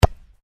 Football kick close, Soccer Ball: Kick Ball, Close Aspect; Sports; Stuart Duffield